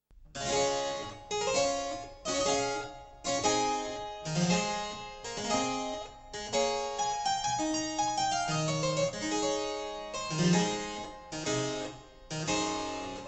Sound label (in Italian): cembalo